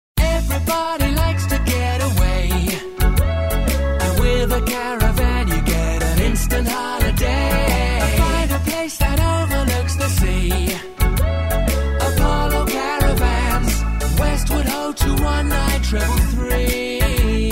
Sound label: sung radio ads